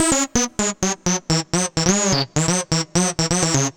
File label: Android Riff, Funk; Dr. Fink's Funk Factory